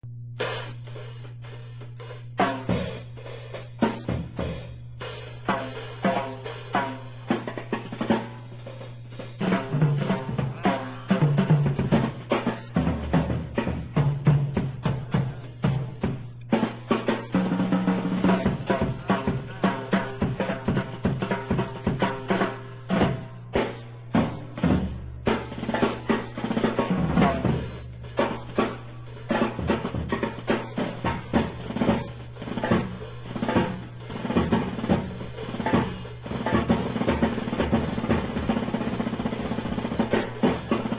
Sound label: duet